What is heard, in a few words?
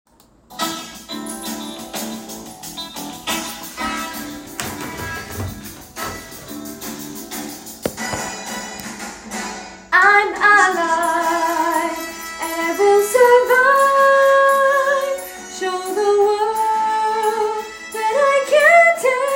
Melody + Soprano; Fame The Musical - Holiday Pack